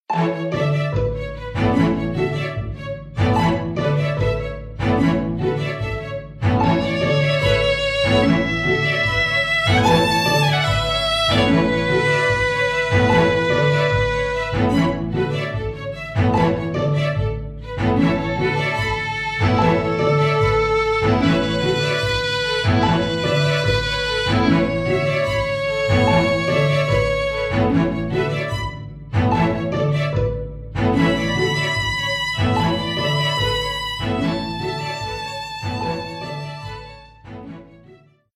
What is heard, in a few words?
procedural midi strings